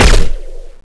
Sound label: plasma fire